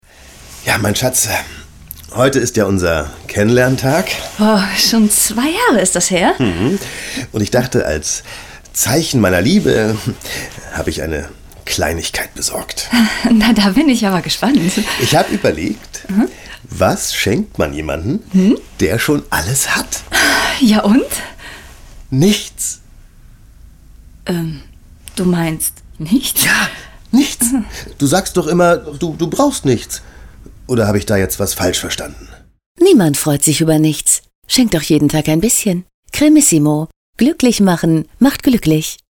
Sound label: Funk; Cremissimo; Das Paar